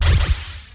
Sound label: chimera attack; chimera litus